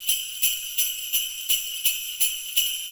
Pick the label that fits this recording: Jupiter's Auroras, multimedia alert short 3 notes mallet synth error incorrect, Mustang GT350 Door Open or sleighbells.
sleighbells